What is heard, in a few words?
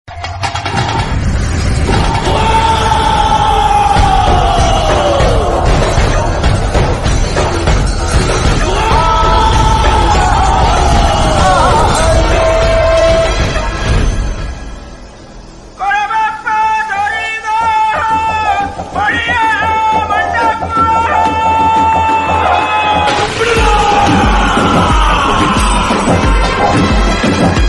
haa ooo bgm ringtone ooo sound bgm ringtone; kantara